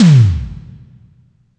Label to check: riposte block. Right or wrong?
Wrong